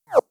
FX whizz

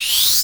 Whish down to up

